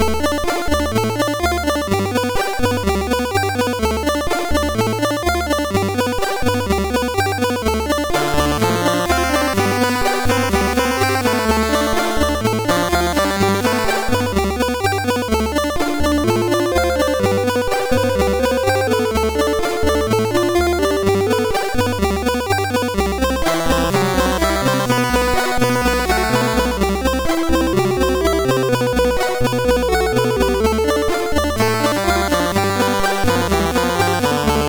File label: Tapeloader 8580; MOS6581R2